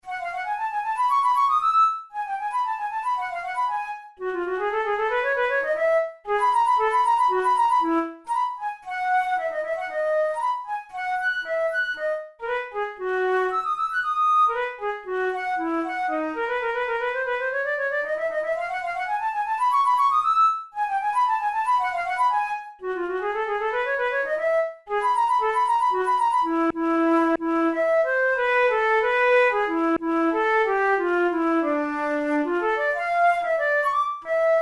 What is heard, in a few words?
russian dance, flute; kohler op66